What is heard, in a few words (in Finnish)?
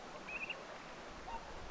tuollainen tyllilinnun aani